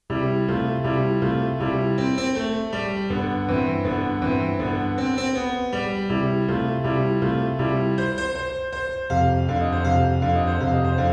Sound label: piano pop